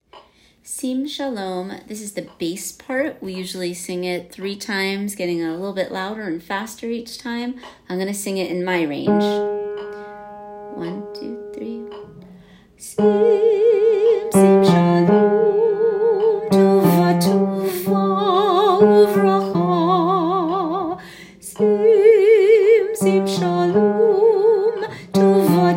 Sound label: Bass; High Holidays